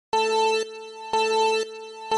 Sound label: mario kart start 3 2 1 go